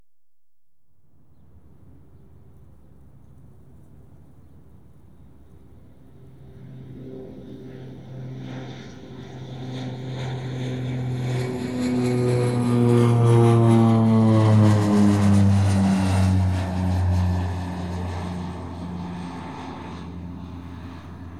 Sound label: FLY OVERHEAD AFTER TAKE OFF, Aircraft; The BBC Sound Effects Library